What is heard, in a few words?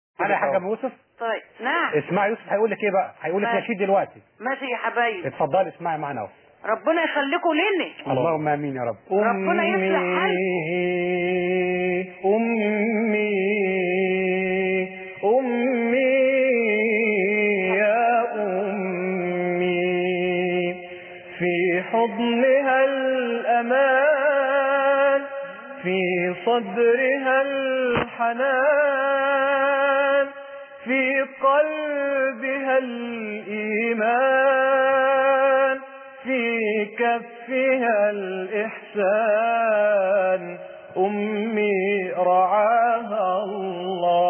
nasheed